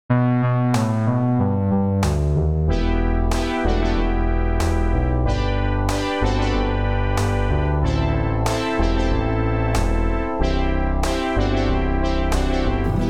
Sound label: guitar